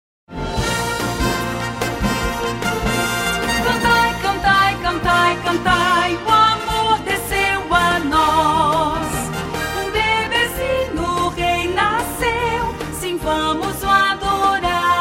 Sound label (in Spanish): Contraltos; cantata navidena